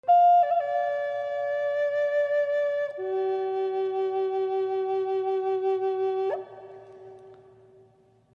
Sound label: flute; native american flute